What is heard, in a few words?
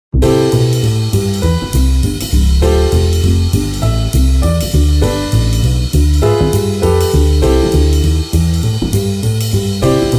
jazz BeBop